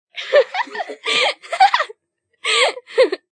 Kids Giggling, Children, group, laughs, giggle, kids, cute, speaking, (human voice); Sound Effects:Human; VoiceBox